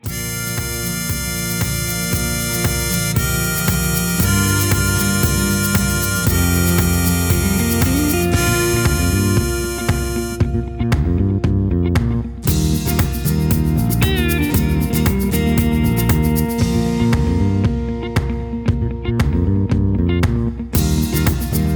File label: Instrumental no leads